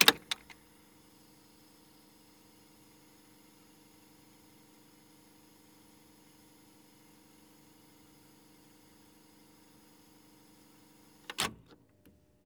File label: Gear Foley Reel to Reel Reverse; FX; Cymatics; Eternity Sample Pack